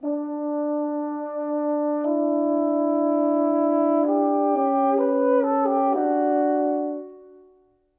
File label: Horns, Orchestral; Sonic Foundry (Sony Creative Software); Orchestral VOL-2; Modern 01